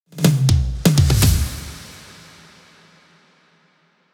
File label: Drum Fill 123bpm